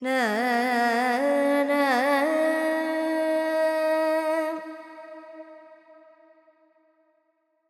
FX Dorian, Vocals, Carnatic Female, Alaps, 70 G

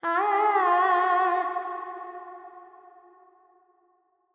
Pentatonic, Vocals Carnatic Female Alaps 70 G

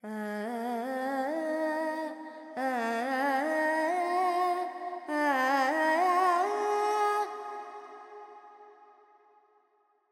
Pentatonic, Vocals, Carnatic Female, Alaps, G

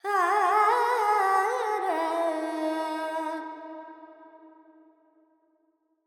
Verb Charukesi, Carnatic Female Alaps; Vocals; Sample Banks

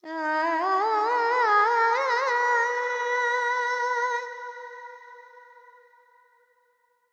FX Phrygian, Carnatic Female Alaps; Sample Banks

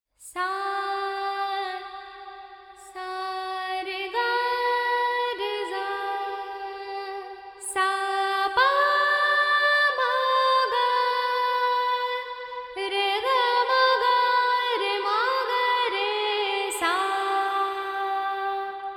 FX 4-4 G 100, Vocals Female

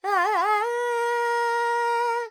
Dorian, Vocals Carnatic Female Alaps 70 G Dry

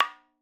madalam notes R; Sample Banks; Percussion; MADHALAM

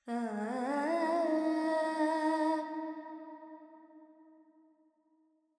Verb Phrygian, Vocals, Carnatic Female, Alaps; 70 G; Sample Banks

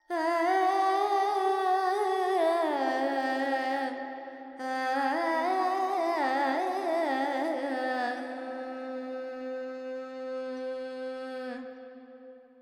Carnatic Female Alaps, Panthuvarali, 100 G, Verb

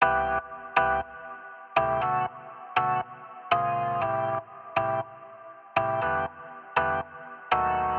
Organ Vintage Em 120; Sample Banks; Melody; Keyboards

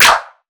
Clap, Percussion; Sample Banks

